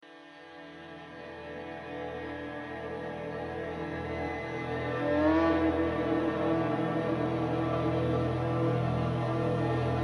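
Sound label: BASS CLUB car audio; BASS CLUB avtozvuk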